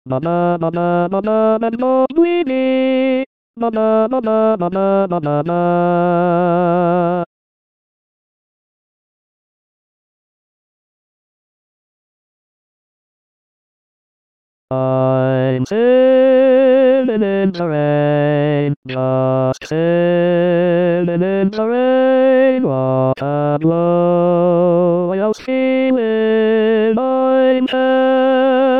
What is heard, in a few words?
tenor